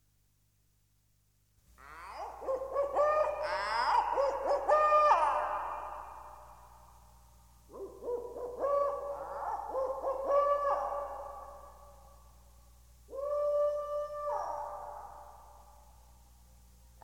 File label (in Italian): UCCELLO GIUNGLA